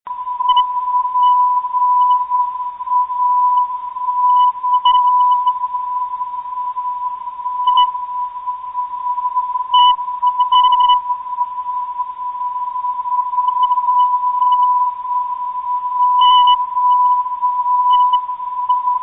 pak 352khz, ndb

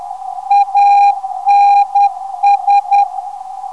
450kHz, ndb

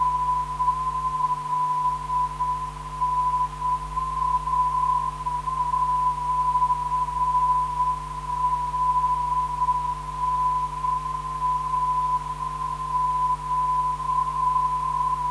LNA 314kHz

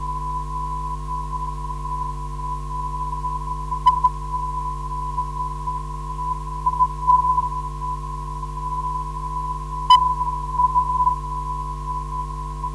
ZEL 364kHz, ndb

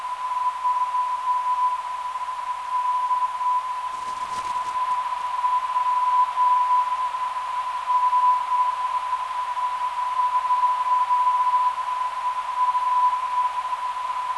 WN 413.72kHz, ndb

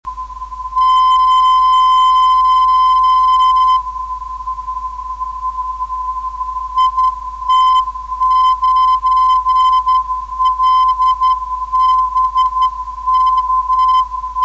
10142khz, hf beacon; it9lbk

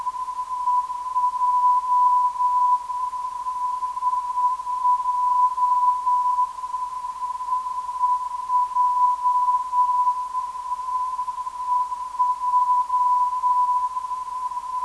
400.5kHz, ndb

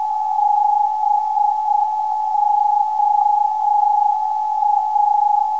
413kHz, ndb; BOA

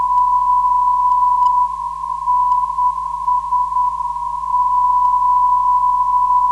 255kHz, ndb

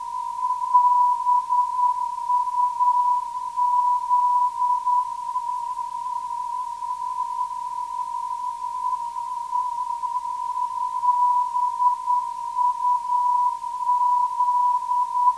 339.95kHz, ndb; BUZ

